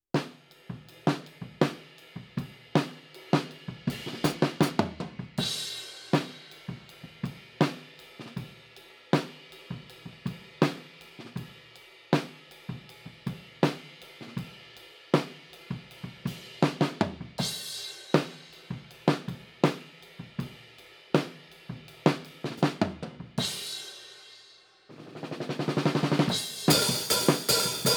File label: HiHat, 160 BPM